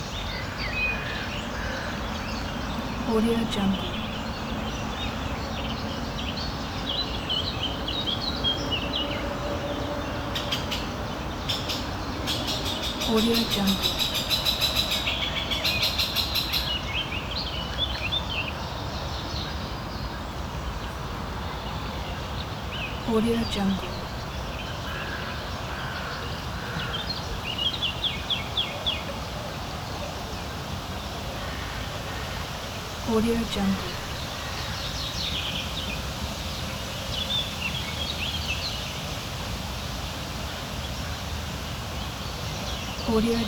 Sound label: riverside birds distant city